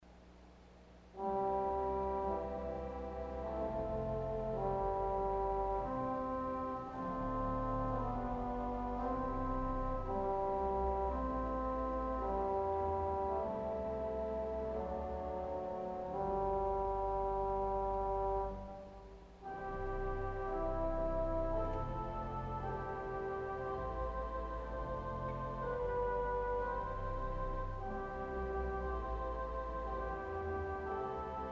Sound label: Cor Damour St Anne Solo, organ stop; CulverAcademy